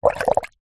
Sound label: food drink bubbles blow into glass water through straw; zapsplat